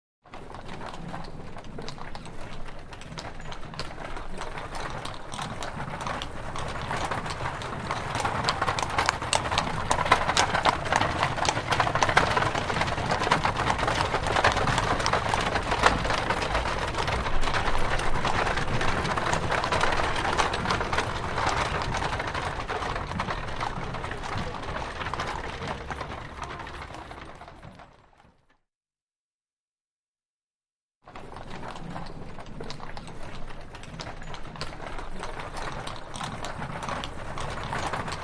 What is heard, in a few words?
Carriage Start By, carriages